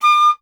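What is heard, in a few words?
flute piccolo; Miroslav Vitous - Solo Instruments VOL-2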